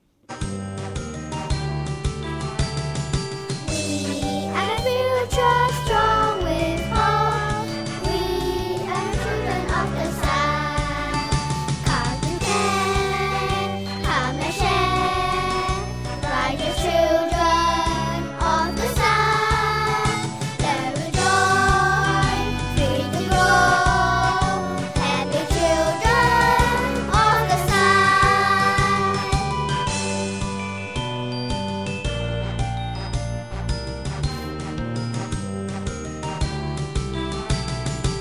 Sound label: School song with singing